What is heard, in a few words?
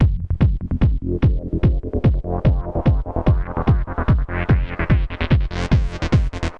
Essential beats